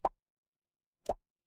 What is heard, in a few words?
cartoon pop